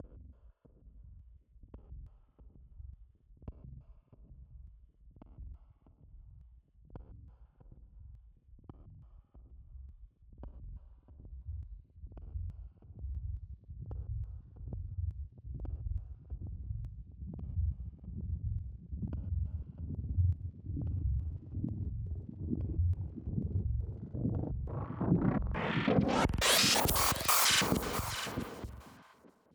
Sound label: Riser 12 Bar 138BPM